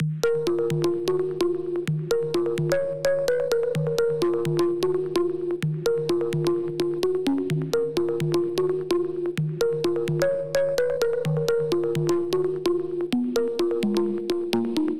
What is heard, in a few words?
128 BPM D# Min Pluck; House; Loop Stems; Cymatics; Breakdown Loops